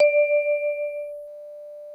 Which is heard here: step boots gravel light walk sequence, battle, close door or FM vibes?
FM vibes